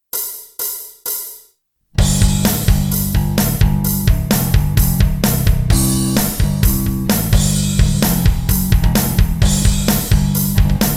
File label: music bass guitar; startup optical